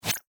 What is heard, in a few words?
Pop Up Exit